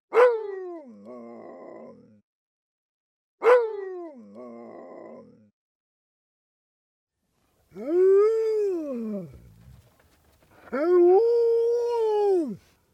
Sibirischer Husky; animals mammals; Woelfe Schakale Hunde

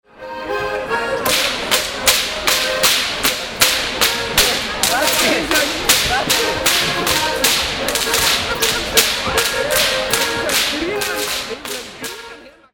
Goaslschnalzer, Oktoberfest; oktoberfest munchen; Oktoberfest 2010

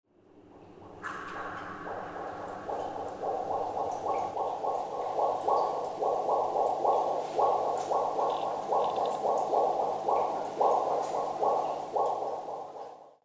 Weinkellerimpressionen, Weinberg; rund um den weinberg

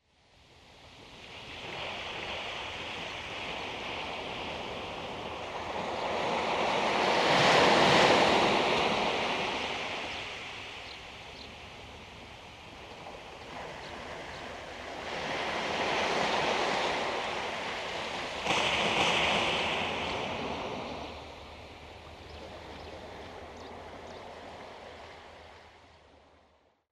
Meeresrauschen; Geraeusche

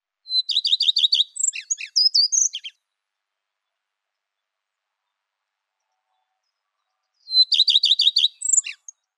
Gartenrotschwanz; Garten erwacht

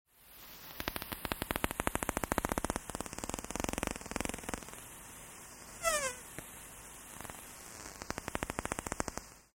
Schweinswal; delfinstimmen und walgesaenge; Walstimmen

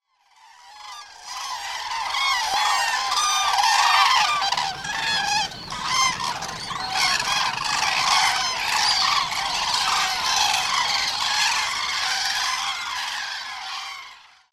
Kranich Abflug

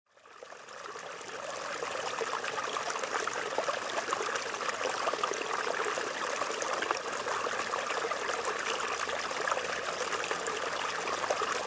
Bachrauschen; Geraeusche

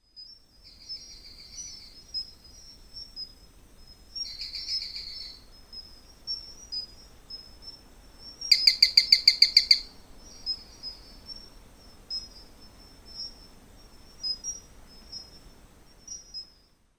Regenwalddialoge, Madagaskar

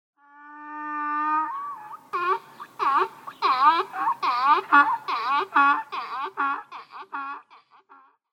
Esel; Tierstimmen; hausesel; equus asinus asinus

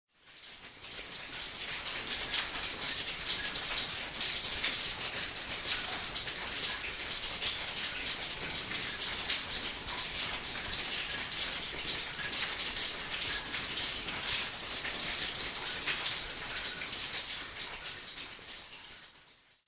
In der Wendelsteinhoehle; Geraeusche

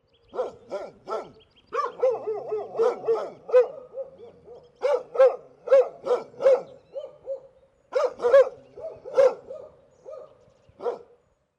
Persischer Pariahund; Woelfe Schakale Hunde; les voix des loups chacals et chiens

